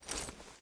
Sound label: angelic step; smite; thanatos